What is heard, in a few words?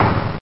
click; DAoC; Toa